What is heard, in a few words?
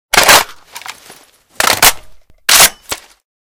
reload empty, weapons m14; M14 Reanimation; librarian m14